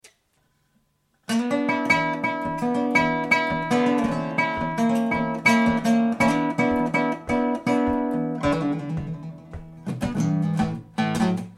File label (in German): Country Blues Wohnzimmeraufnahme mit DAT Rekorder ohne Soundeffekte ohne Klangbearbeit, guitars